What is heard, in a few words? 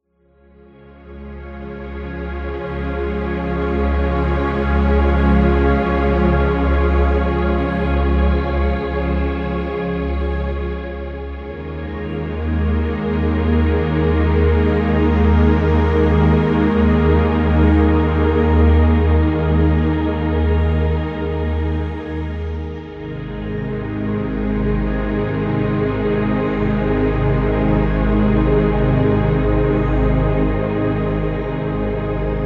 Meditative